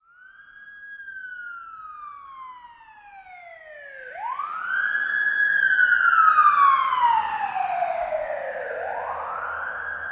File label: Police Siren: Tunnel Medium Car By, with Various Types.